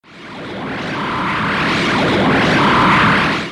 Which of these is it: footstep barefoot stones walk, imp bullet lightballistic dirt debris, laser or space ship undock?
laser